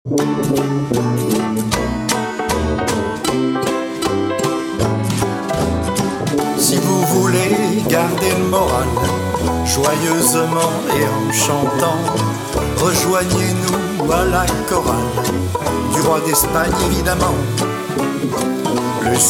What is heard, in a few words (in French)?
la chorale